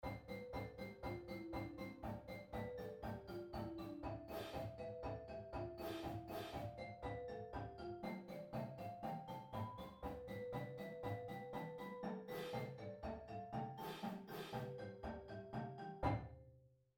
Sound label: Stock 8s and Bucks, Drumline; Drumline Exercise; pdlmusic; Drumline Ensemble Series